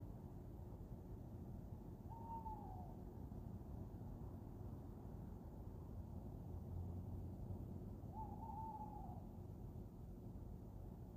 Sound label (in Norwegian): ugle